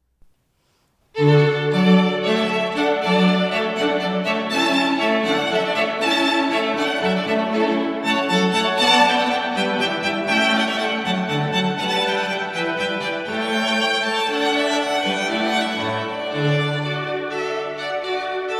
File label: Handel Hornpipe, strings